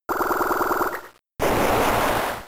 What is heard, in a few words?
Waterfall; Pokemon; Gen 3; attack moves; RSE